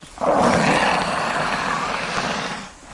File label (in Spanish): Dragon de Komodo